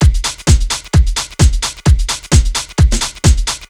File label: Drum Loop 130, Downtown House; Drum Loops 130bpm